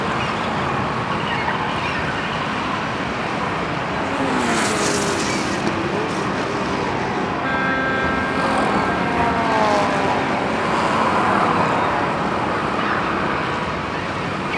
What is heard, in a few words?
Ambient